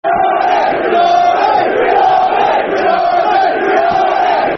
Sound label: Green Army, Green Army, Green Army, Football Chants; Plymouth Argyle Pafc